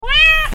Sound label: cat meow